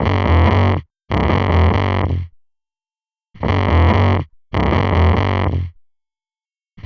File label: Fuzz Bass; Sonic Foundry (Sony Creative Software); Electronic Point Blank; Basses